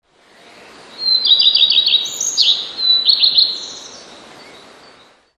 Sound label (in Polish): pleszka, jedna piosenka pleszki; wroblowe; zapozyczenia